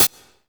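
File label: hi-hat closed; REMIX 3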